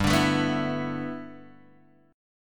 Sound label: G 6th Add 9th, Guitar, Open G